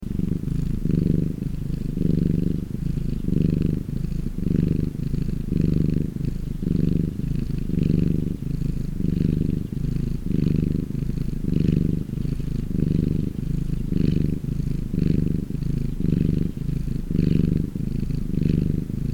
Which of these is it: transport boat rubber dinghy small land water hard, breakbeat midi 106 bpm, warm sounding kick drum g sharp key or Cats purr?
Cats purr